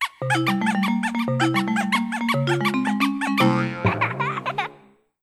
Nintendo Gamecube (Z on 1 Controller) Startup; Video Game Consoles Startup

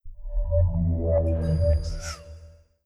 Samsung Galaxy S4 Shutdown; unused

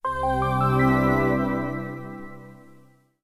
Playstation Portable (PSP) Startup; Video Game Consoles Startup

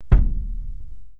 Windows 73 Startup & Shutdown; Windows Never Released Soundpack 2

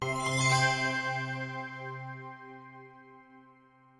Linux Mint Startup, Operating Systems Soundpack

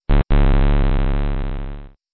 Windows Shutdown; Windows Command 4.0 Shutdown; Windows Never Released Soundpack 2

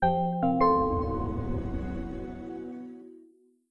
Windows Jupiter Startup; Windows Never Released Soundpack 2

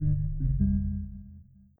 Startup; Windows Never Released Soundpack 1

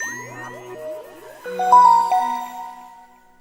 Samsung Galaxy S3 Startup

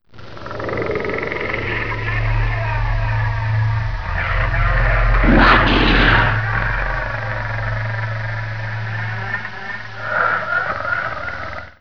Atari Jaguar CD Startup; Video Game Consoles Startup

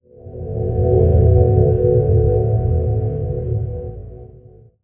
Windows 4.0 Beta Startup; Windows Never Released Soundpack 1

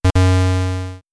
Windows Command 1.0 Shutdown; Windows Never Released Soundpack 1

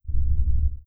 Startup & Shutdown; OS-Ready 1.0 Startup & Shutdown; Windows Never Released Soundpack 2

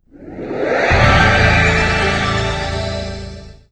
Nintendo 64-64DD Startup; Video Game Consoles Startup

